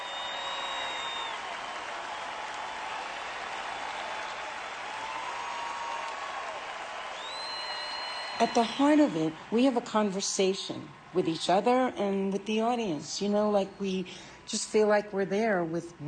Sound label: Crowd Audience